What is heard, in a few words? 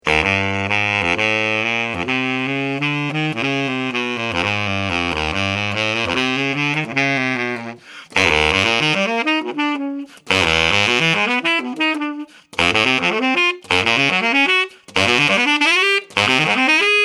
crossover Bari Jazz; Soloist